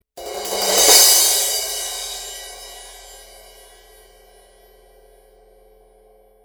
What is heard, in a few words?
medium crash 16, cymbal; sabian